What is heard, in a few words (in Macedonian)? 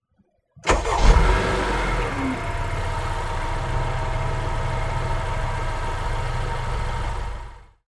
avtomobil